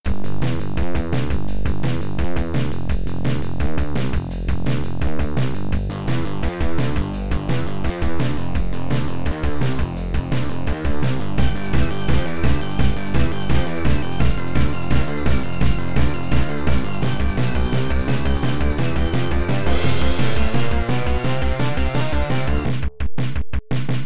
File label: Instant Rematch Timer; Banzai Run; Williams; pinball